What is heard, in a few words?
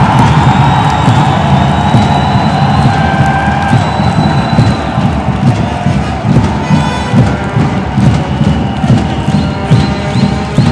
soccer fans; timbers army best us